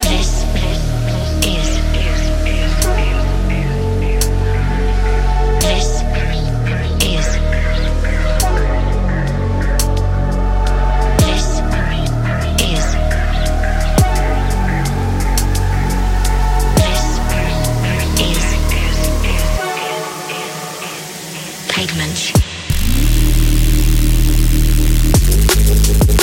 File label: Bass; Bass Thermal; ARTFX